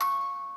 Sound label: musicbox